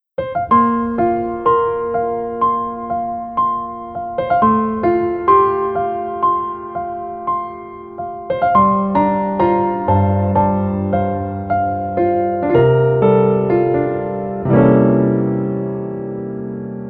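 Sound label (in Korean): Piano version 멜로디 MR -1키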